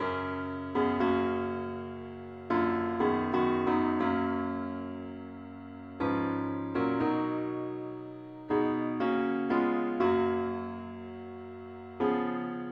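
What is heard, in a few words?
satb Tune keyboard; Jesus, Saviour, pilot me Over life's tempestous sea; Unknown waves before me roll, Hiding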